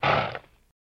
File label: Horse Blow Generic